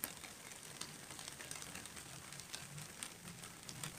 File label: rain; 30 cc0 sfx loops